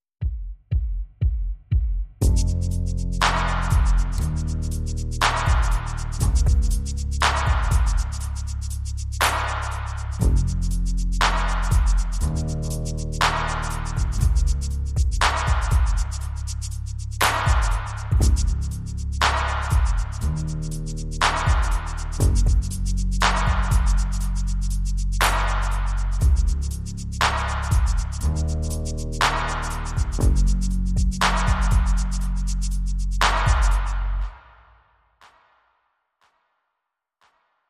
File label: Drums and Bass Mix